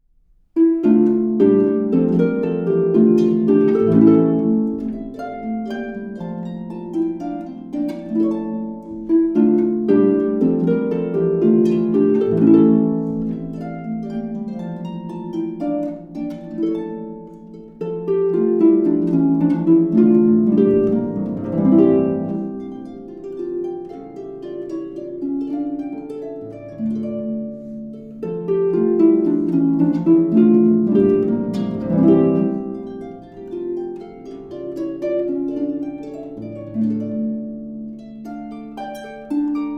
Bourree, harp

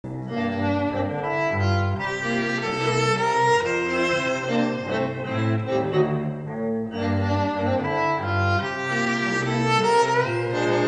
Live Clip, String Quartet

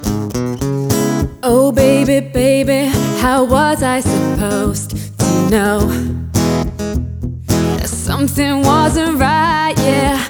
Acoustic

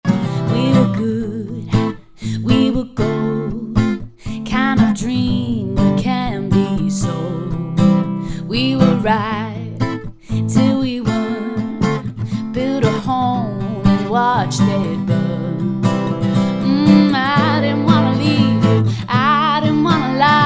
Acoustic

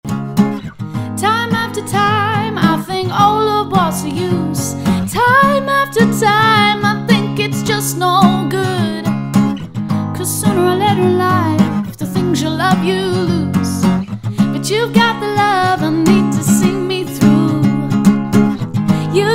Acoustic

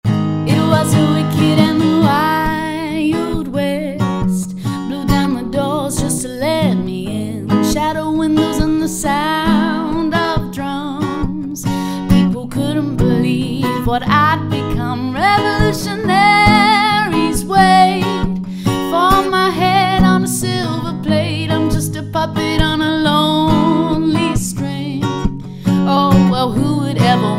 Acoustic

